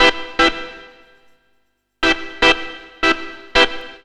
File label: CLAV ORGAN; USB Soundscan vol.32; Roots Disco; AKAI